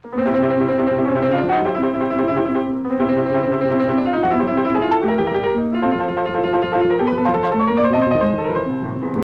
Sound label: piano octave